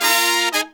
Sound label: Pop & Funk Brass